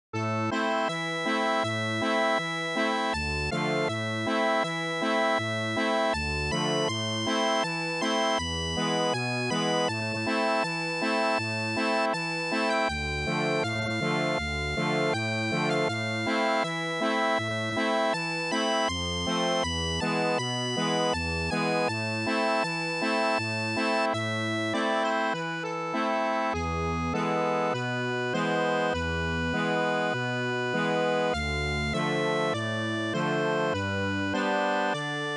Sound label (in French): Accordeon